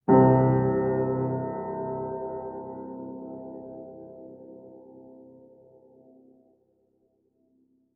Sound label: piano